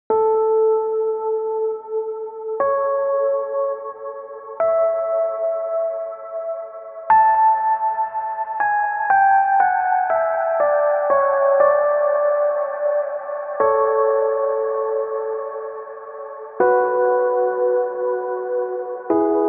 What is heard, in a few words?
e piano mix